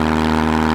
Loop, Engine, buggy

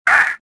gasp; Star Wars Jedi Knight II Jedi Outcast; XBOX

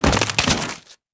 deadfall stone, foley

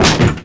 door slam down; Tony Hawk's Project 8; XBOX

